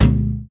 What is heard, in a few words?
metal collision hard; The Swapper